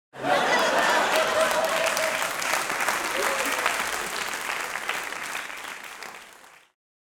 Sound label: Audience applauding; Laughs